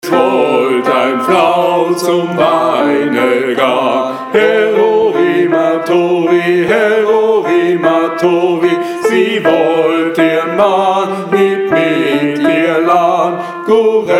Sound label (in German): TENOR 1; Gesang; Es wollt ein frau zum weine gahn